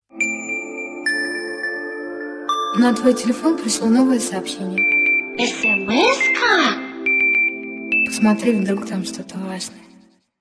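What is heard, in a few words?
SMS notification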